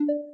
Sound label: close